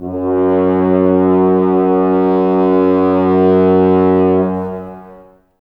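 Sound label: Brass French Horns, Woodwind Brass Ensemble; Sample CDs; Miroslav Vitous; F.Horns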